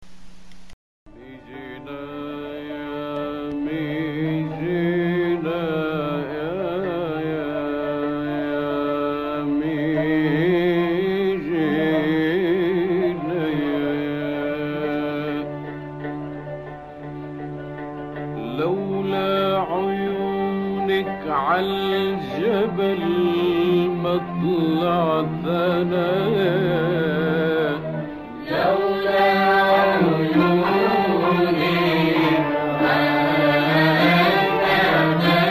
Ataba, Ataba and Mijana on “Bayati”, by the Lebanese singer